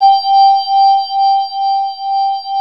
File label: G4 FOF vox, choir; NorthStar - The Wizard VOL-2; C-SND CHOIR